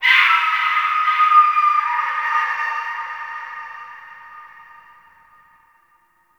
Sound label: Forest Distant Bird Of Prey, Ambience; Warframe; Grineer